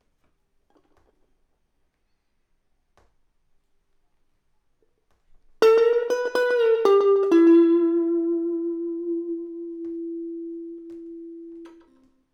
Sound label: Elect Guitar